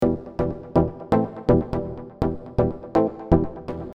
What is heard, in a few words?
Pluck Serum Deep House; deep house bundle